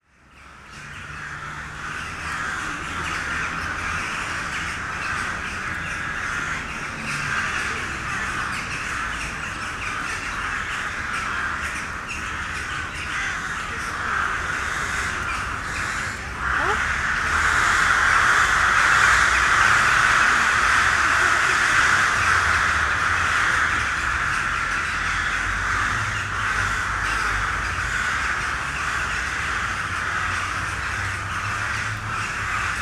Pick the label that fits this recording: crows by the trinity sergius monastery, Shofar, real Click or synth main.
crows by the trinity sergius monastery